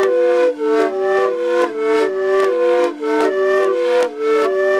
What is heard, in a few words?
loop